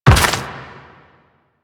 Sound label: Step Dirt, Footsteps